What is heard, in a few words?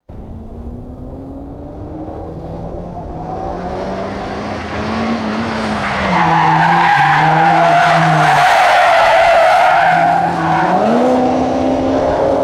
car approaches skids and drives away